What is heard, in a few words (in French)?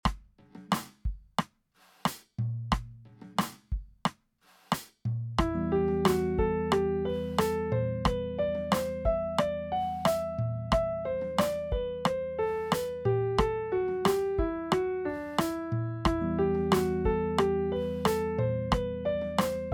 exercice de gamme